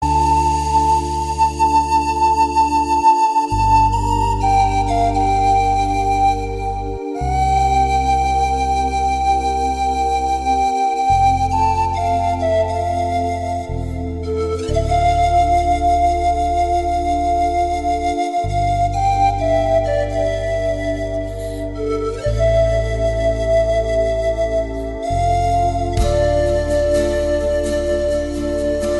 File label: serenade fur zwei ringtone